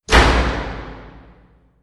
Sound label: Cellar Door Slam Shut